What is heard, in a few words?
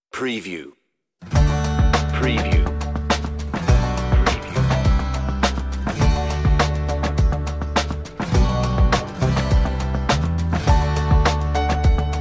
Positive Thinking, on-hold music